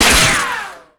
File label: sheetmetal small